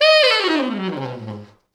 T Sax falls, Horns Sax

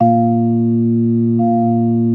B3 A#3; Best Service Hyper Dance

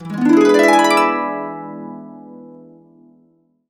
E, Harps

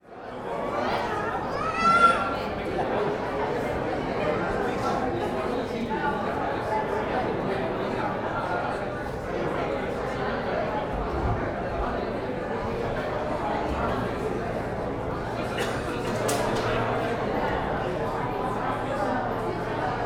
Ambience, Public Place; M8

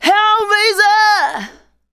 vox, Techno Trance; Ueberschall

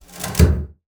BOGEN, BASS FINGER; Xsample VOL-5

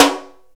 SNR SNARE, snares; Roland L-CDX-01; SNR Snares 3; SNR Sn Modules 3

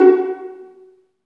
Vlns Pizz; Roland L-CD702

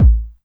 KIT, Dance Mega Drums; Best Service

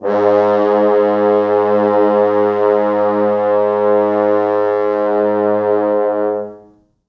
4 FrHorns; G#1; East West; Quantum Leap Horns Sax; 4 FrHorns MOD

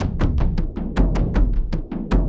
Frame-Kendang Moving, drums; Sonic Foundry (Sony Creative Software) - Friendly Fire

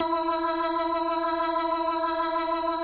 E4F, B3, Vintage Keyboards

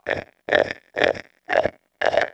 TB THROAT, Talk Box

